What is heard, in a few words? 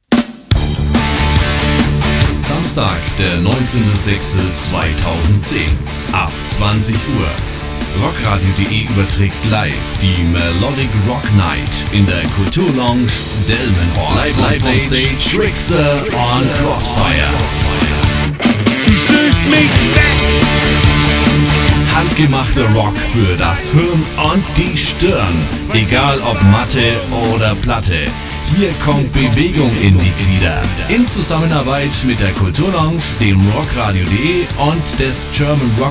Melodic Rock